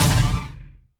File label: hit left; junisaber